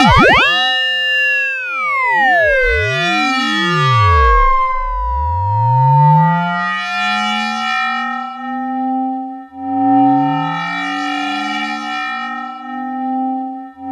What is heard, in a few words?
YAMAHA CS80 RING, Vintage Keyboards; Big Fish Audio - First Call Vintage Keyboards